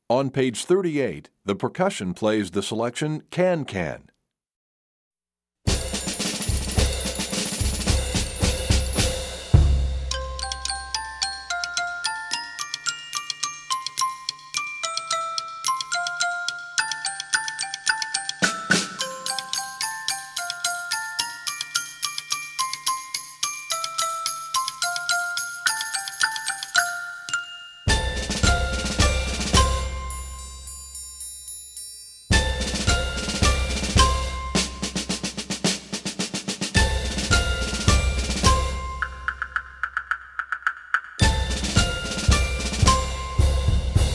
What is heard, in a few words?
can can percussion